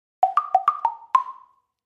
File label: Percus Plastic Block, percussion blocks